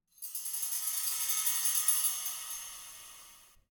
Shine

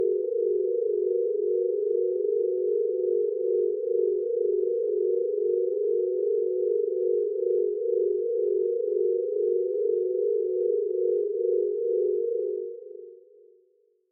Horror Ambience High

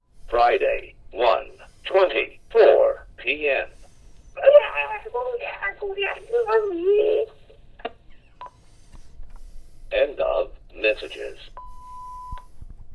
Answering Machine; Sanus Excipio

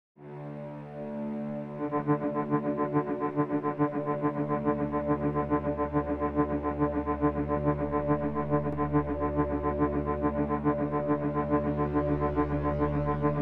Synthetic Build

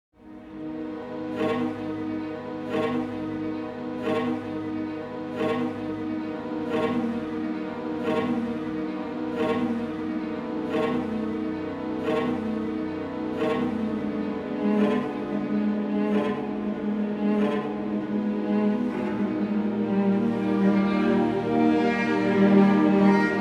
Dark Night In Forest Atmo Orchestral Sad Mood

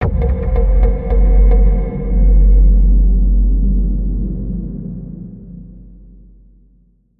Taped marimba Death sound

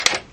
Safe Click

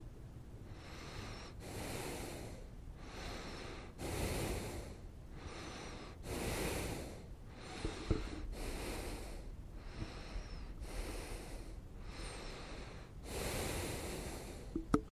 Nasal breathing

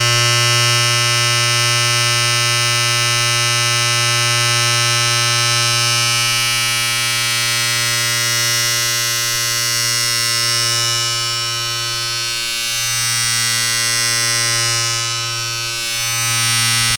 Electric razor

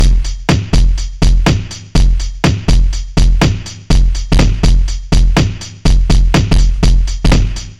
Fat Break Hats

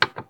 Button Pressed

